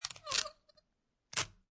Tape Scotch Peel And Tear Piece, Office, Tape, Scotch Tape, Peel And Tear Off Single Piece Of Tape